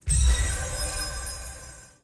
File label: success